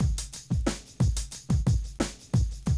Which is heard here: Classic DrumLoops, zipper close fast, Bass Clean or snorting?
Classic DrumLoops